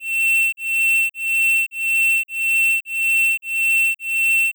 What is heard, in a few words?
blip; ld-39-jam